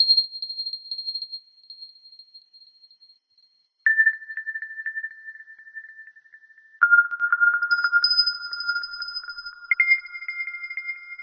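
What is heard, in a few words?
Drum loop slow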